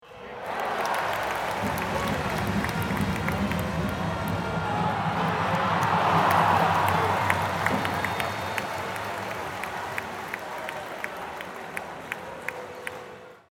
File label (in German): Handball Hallen Spiel Atmosphaere